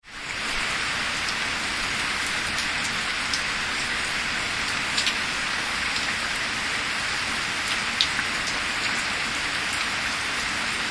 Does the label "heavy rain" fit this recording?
Yes